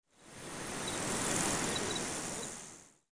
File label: CRICK, nature; PC Gamer IT CD 40; zones